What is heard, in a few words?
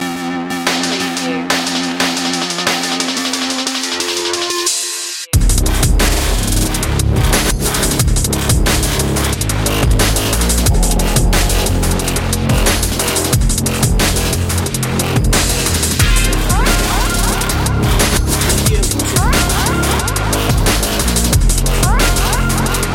underground bass